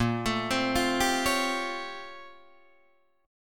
A sharp Minor Major 13th, A sharp, down, Guitar, DADGAD